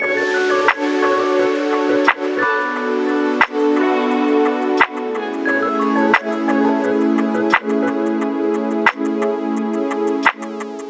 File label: vlog hip hop loop